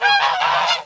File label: Squawk; Princess and the Pea; SoftKey